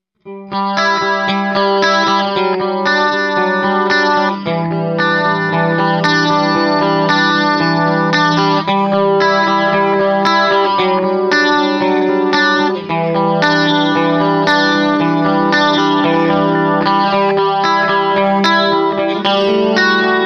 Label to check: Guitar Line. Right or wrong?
Right